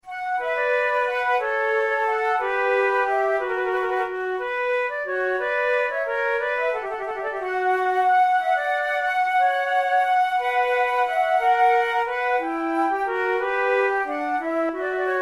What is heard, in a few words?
for two flutes sicilienne; Monteclair; 6 concerts; flute tunes